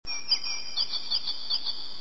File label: Singing Bird